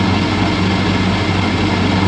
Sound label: Moving Block Loop; Marble Blast Gold